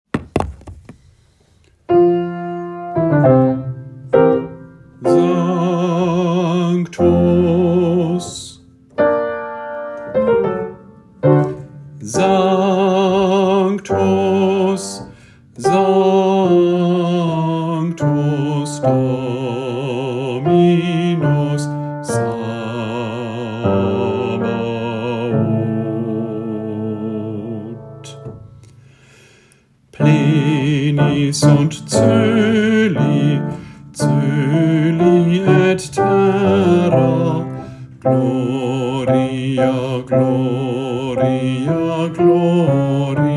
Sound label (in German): Bass Sanctus; Robert Fuehrer